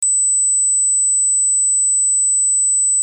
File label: younger 8000 everyone